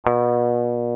GTR ELGTR, Clean E.Gtr, Velo Chord; Roland L-CDX-01

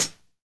LOFI HH, JUNGLE KIT; Drums In Your FACE!

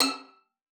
VIOLIN SPICC E6; Xsample VOL-5

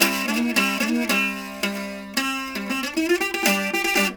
JAKAY; Spectrasonics; Heart of Asia

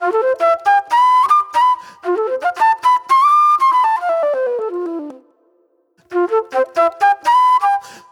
Sweet Soul Flute; Sonic Foundry (Sony Creative Software); Manhattan Flutes; Good Times

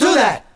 VOX SHORTS; Megabass Remix! 2

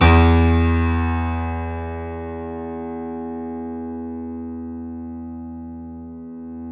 YC7 piano, ff

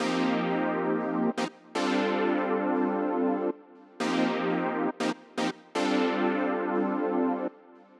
Synth 120bpm Cm, Nu Disco; Zero-G; Construction Kits; Legacy

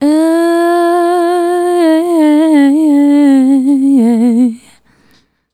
Female Solo, Vocal

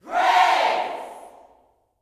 PRAISE, Gospel, YELL SHOUT; Spectrasonics; Vocal Planet

